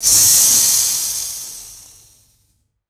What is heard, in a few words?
intense chemical fizz and sizzle; liquid